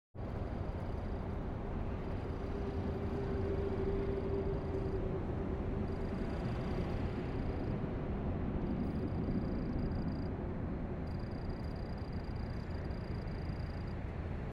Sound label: crickets